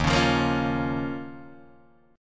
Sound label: D sharp 7th Suspended 2nd D sharp, Guitar, DADGAD